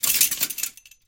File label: chainlink rattle; scripted sequences, a2